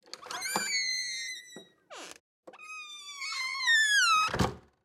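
patio door wood glass interior hinge creak open close; TheLibrarybyEmptySea; Gateway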